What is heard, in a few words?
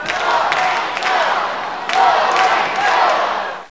Shoop Random Crowd Sound; Intel; Createshare; PC Camera Games